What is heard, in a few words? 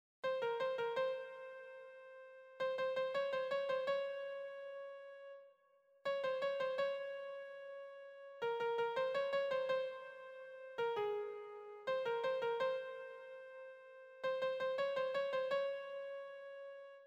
lead, piano